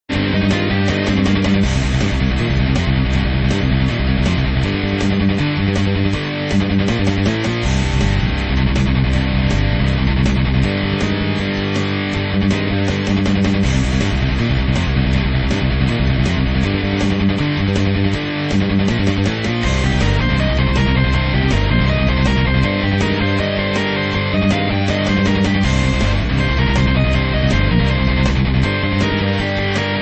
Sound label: Metal Hard Rock Version; Yie Ar Kung Fu